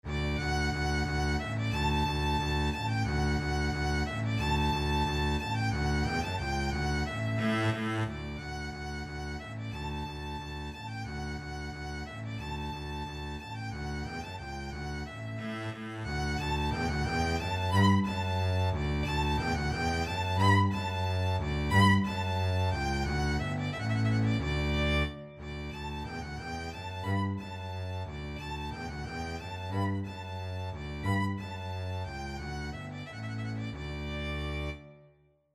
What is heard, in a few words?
violin bass duet; vivaldi spring